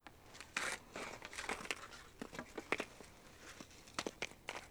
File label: Hockey Puck Handle; Sports & Recreation